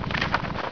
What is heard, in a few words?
PAPER, HOUSE